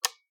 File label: switch, UI Audio; Kenney's Sound Pack